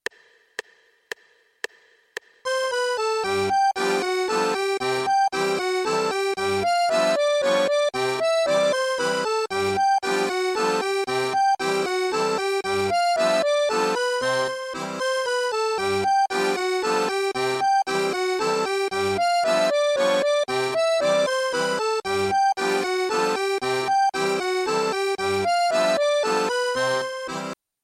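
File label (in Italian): Alla Tirolese, Fisarmonica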